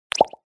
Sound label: Bubbling; Tecno Spark 40C